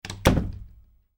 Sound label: household door wooden internal shut; Door Shutting - Wooden Internal Door Closed; Miscellaneous Doors (Sound Effects:Doors); McKinney Sound